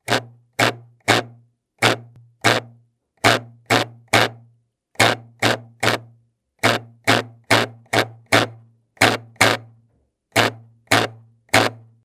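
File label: ruler sound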